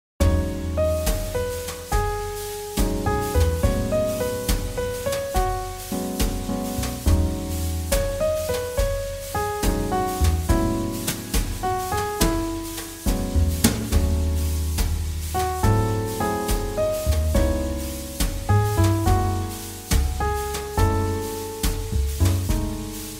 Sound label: Jazz Ballad